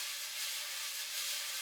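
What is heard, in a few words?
fast brush; Northstar - New Gold VOL-2; 50S BRUSH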